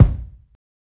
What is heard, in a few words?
KICK